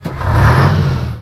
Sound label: apc start, car